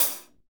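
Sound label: HH, hi-hat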